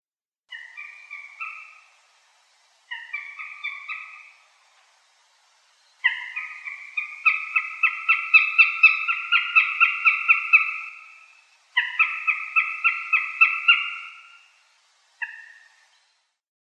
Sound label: Accipiter gentilis